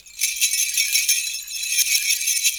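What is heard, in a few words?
Tanzania bells; Roland LCDP14 Africa VOL-2; Perc FX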